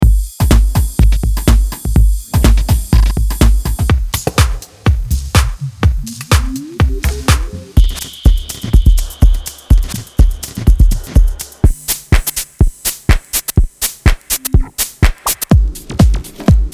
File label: Organic Elements drum; Black Octopus Sound